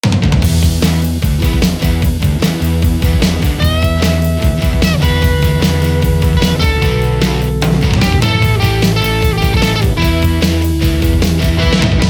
vintage vox; softube

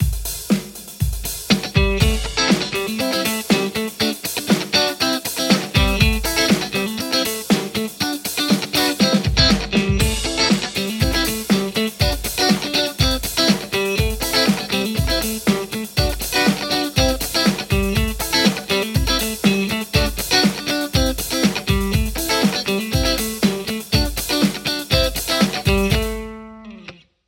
strat JCM800 hot; mercuriall

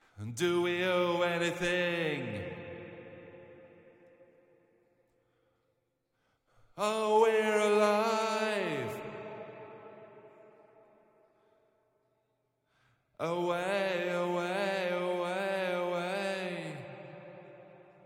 vocals back

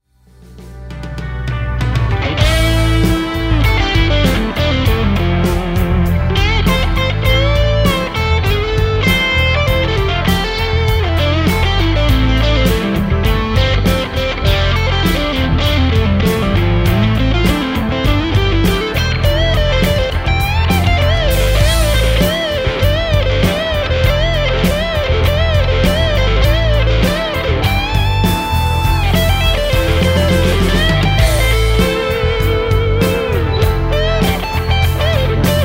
lespaul, JCM800 hot; mercuriall

